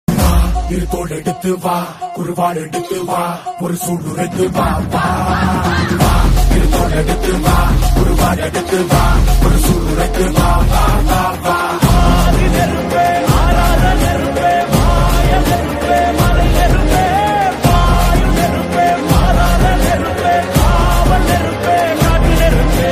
ringtone bgm; kanguva; siva

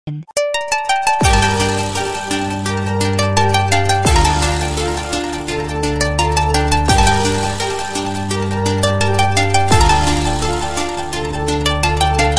best love ringtone, telugu love bgm ringtones

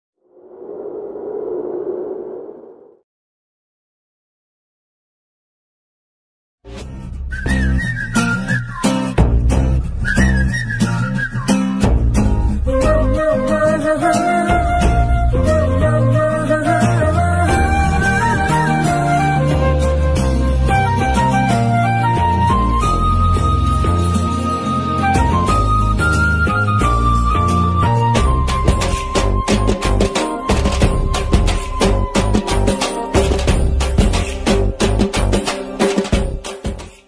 arinthum ariyamalum love bgm ringtone

